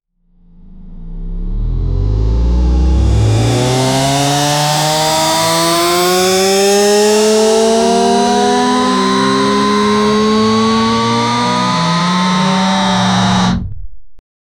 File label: a sci fi cyberpunk hyper; badass